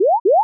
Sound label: question, retro synth; agentmag